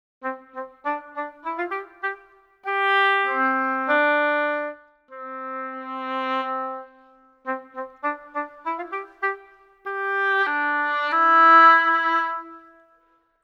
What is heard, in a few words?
Symphonic Woodwinds Oboe